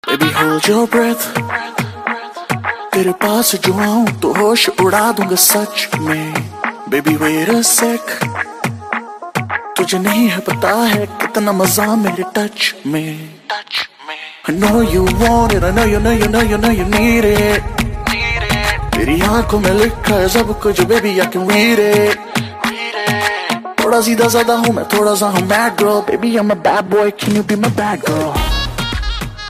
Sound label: Romantic Hindi Ringtone; ringtones